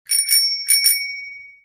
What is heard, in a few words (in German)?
Fahradklingel; musterhausen